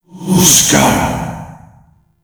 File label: Alt Fire Whispers; Warframe; Tenno; Grimoire